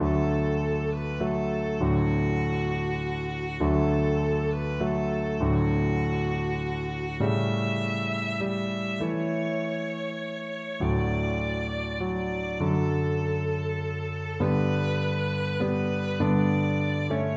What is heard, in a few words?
silent night violin